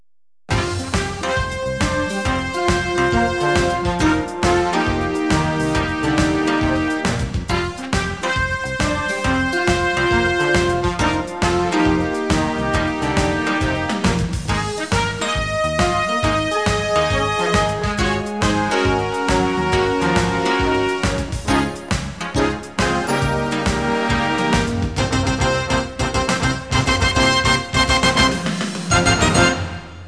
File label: VICTORY